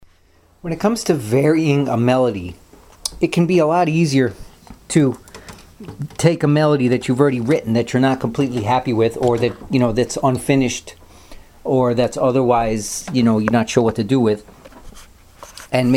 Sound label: varying a melody